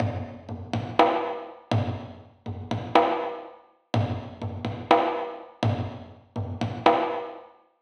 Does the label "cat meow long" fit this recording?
No